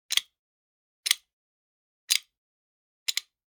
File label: Generic Button Metal Small